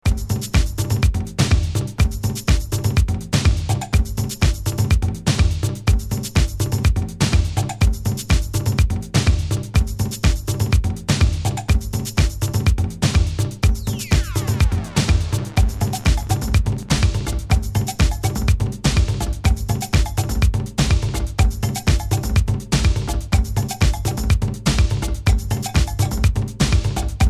Delorean Drums

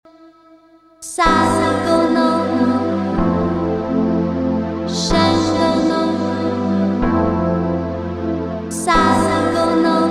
Soft Keys Bubbles Acapella

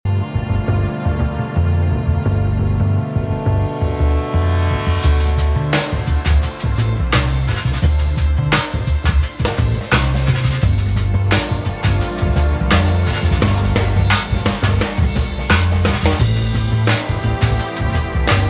Instrumental remix, downtempo

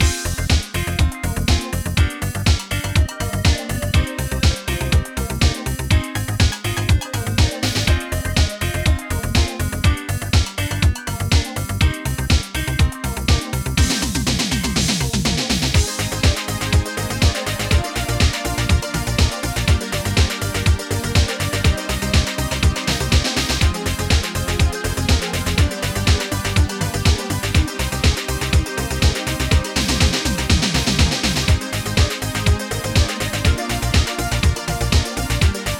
Dub Remix

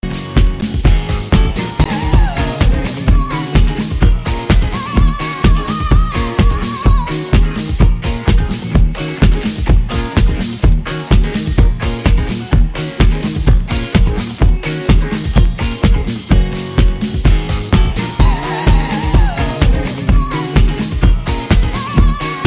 dance disco heat short instrumental; disco boogie

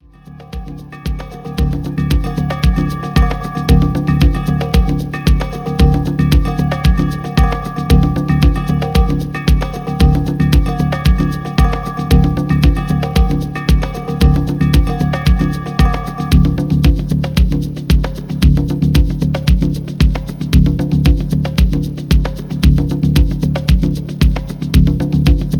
batucada

